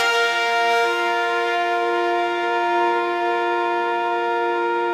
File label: victory horn; sound effects